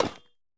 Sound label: break, copper statue